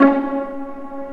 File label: Vla Piz C#3; Digital Sound Factory Virtuoso